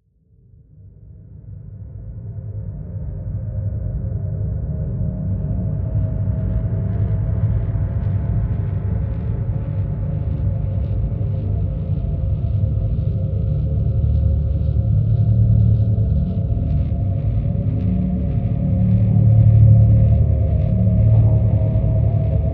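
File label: drone D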